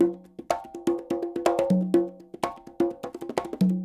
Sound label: SHUF, Percussionworks; Sampleheads; NYC Percussionworks